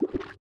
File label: charge, sculk; minecraft; block